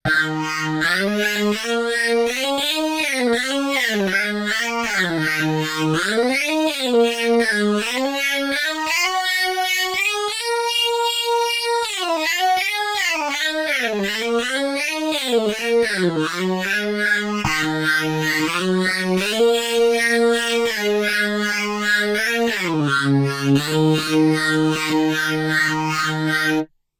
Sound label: solo; Pony Fan Music Remix Stems